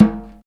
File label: PERC